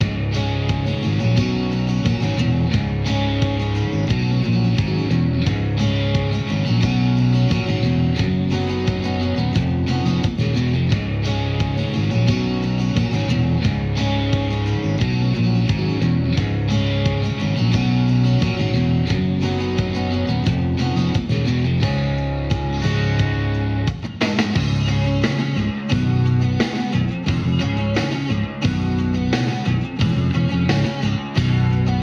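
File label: music loop jukebox; Joe's Diner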